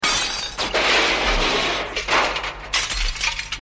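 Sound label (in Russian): камень разбил окно вдребезги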